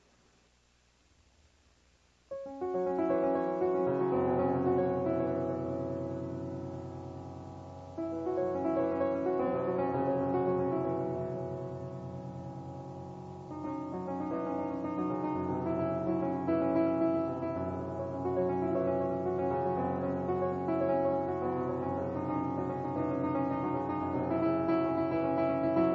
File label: Prelude for 19ET Piano